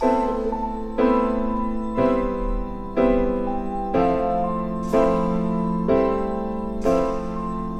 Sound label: piano, Loops Sad